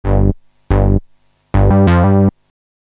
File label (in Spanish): sonido bass hip hop